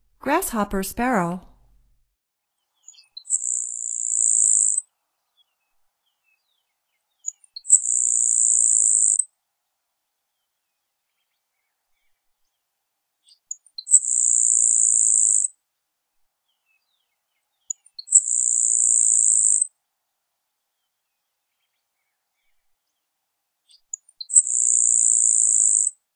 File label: Grasshopper Sparrow, Songs of Birds of North America; Stokes